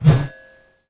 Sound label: Heavy sword woosh; Shelter 69